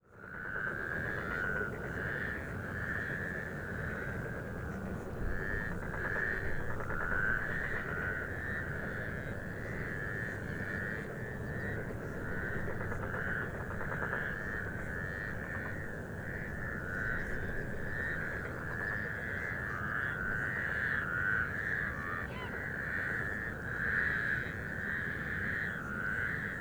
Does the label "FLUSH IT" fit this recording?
No